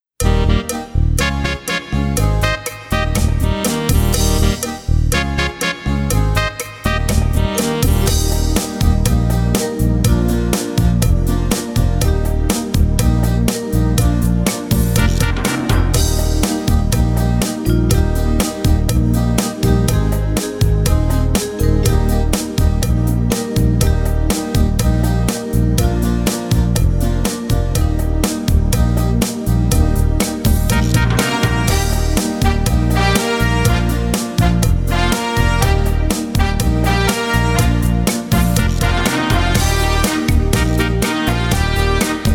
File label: Thank You Karaoke